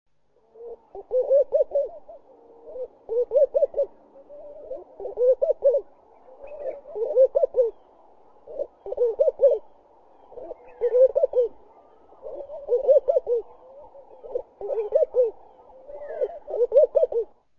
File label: Laughing Dove